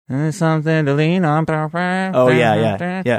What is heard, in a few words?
singing something to lean on saying oh yeah yeah yeah